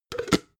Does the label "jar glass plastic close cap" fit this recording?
Yes